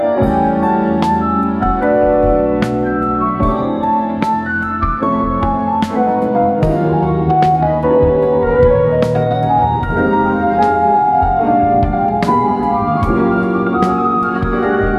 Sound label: mellow jazz morning; Weather Channel Music Generator